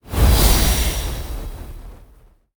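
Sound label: Pillar purple attack, monster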